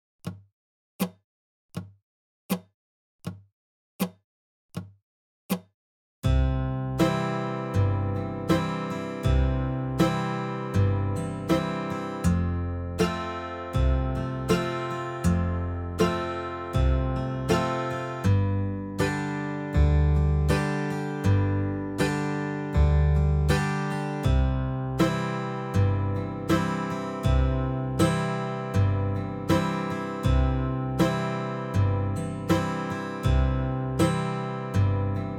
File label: Key of C backing track - 40 BPM